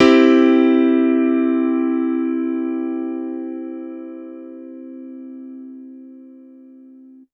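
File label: Piano Electric major; TrashLibrary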